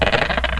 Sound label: Roll, backgammon; Outland